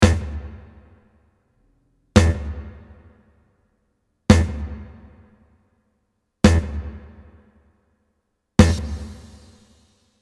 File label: no guitars